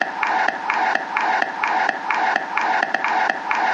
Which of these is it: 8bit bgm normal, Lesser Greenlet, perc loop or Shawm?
perc loop